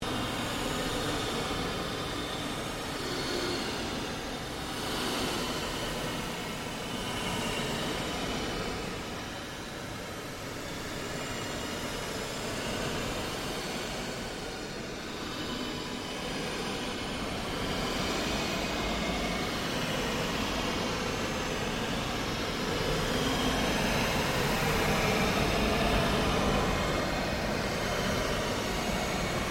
Created Ambience Sweep Loop, Sweeper Sound Effects; Sound effects created by manipulating other sounds